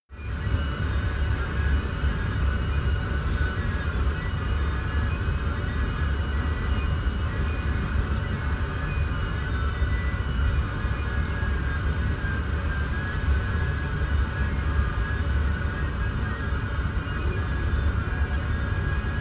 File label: bathroom